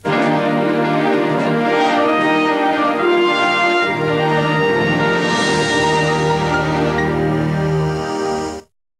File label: Ident; CNNI; Tower Bridge